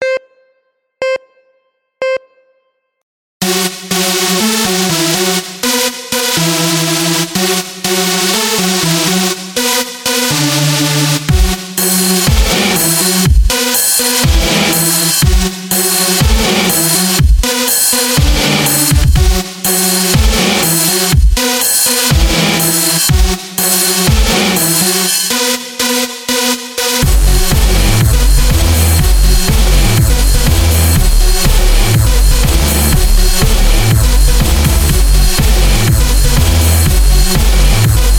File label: Distorted Blast